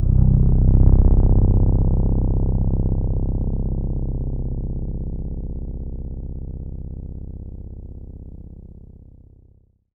C1, Synth Line; 90 s Sample CDs; NiceBeats; SynthLine VOL-24

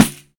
Pandeiro Hit; Roland BK-5; Percussion

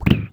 Wibble, Synth FX, cartoon; musicradar; cartoon caper

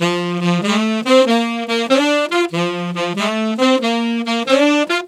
Sax Section in F, Jazz; Traditional; Sonic Foundry (Sony Creative Software); Jazz Solos and Sections

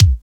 KIK SAW KIK, Kicks; AMG; Now CD-ROM (Roland); NOW! Drums

